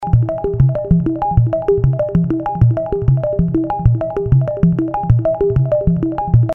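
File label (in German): Transistor-Kaskaden-VCF, Filter-Eigenoszillation, Modulationsquelle: Sequenzer CV-Out; modular synth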